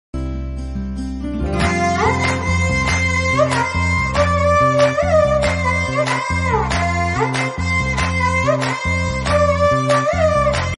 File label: entry bgm; uppena